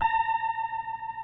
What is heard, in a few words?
GUITAR FX